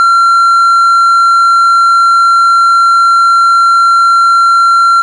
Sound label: Beep High Long